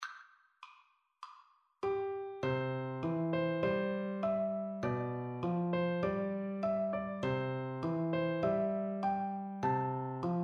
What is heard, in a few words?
easy piano solo; auld lang syne